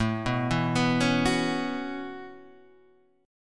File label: B 7th Suspended 2nd Suspended 4th, chord